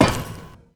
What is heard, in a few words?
ladder, footsteps